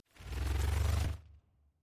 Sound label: raw idle; khazix